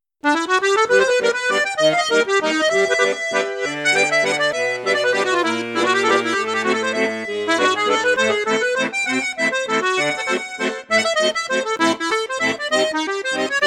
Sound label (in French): registre flute juste flute haute, accordéon; maugein export